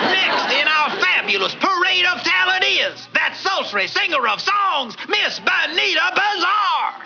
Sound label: Sultry Singer; Peter Platter: (Peter introduces Benita)